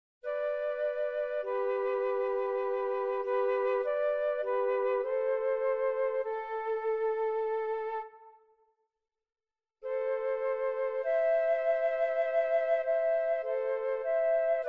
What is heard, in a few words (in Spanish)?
canciones para flauta; CANCIONES DE FLAUTA PARA INICIACIÓN